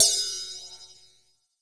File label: RIDE, ELEC DRUMS; EdgeSounds; Drum Mashines VOL-1